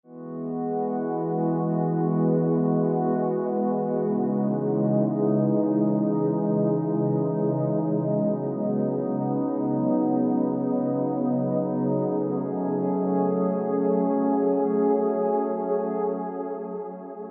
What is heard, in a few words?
angelical pad